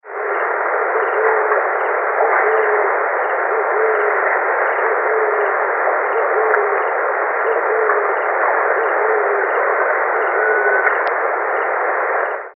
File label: white-faced scops owl